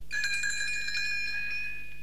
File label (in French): Fee clochette